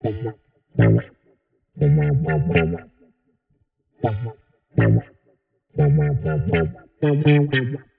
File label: 120 C Funk, Warped Bass; Loops; Touch Loops; CosmicFunk